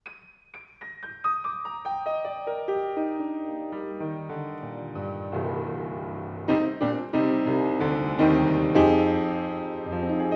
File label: Piano, Cardioid XY 110